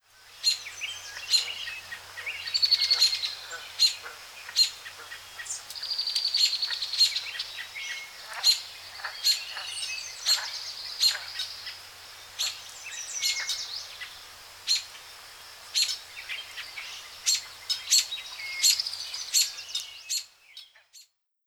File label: Christ the Redeemer Nature